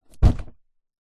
foley cardboard box parcel wrapped paper set down thud